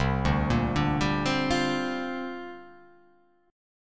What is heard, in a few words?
C 7th Suspended 2nd Suspended 4th C down; C7sus2sus4 arpeggiated